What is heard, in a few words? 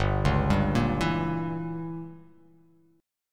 G Diminished 7th